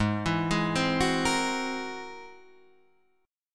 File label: G sharp Augmented 9th G sharp Guitar Open D